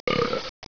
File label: Belch